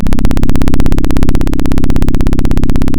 Sound of a plane taking off

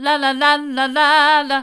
la la hard, vocal

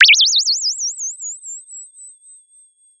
Sound of a bird tweeting nicely

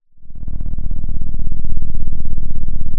Sound of an engine revving

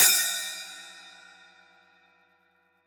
Trash B, drum

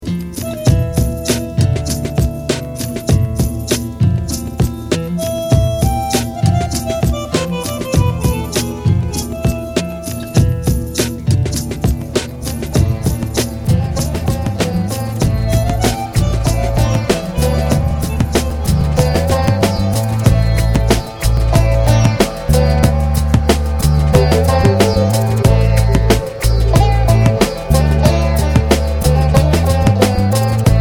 Bandoneon To Lebanese

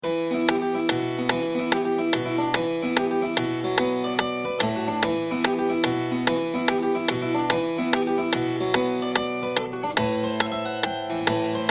loop mono

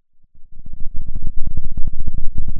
Sound of a thunderstorm, center

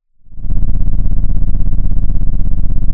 pop, Sound of an engine revving

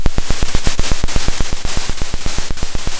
Sound of a drum roll

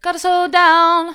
gotta slow down mid, vocal

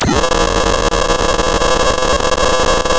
pop, tearing paper

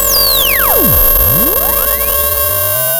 sound of a bike bell

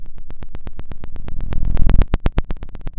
pop, Sound of a fire crackling

